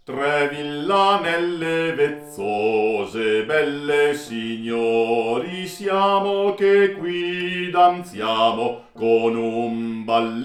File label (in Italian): Balletto di villanelle bassi e tenori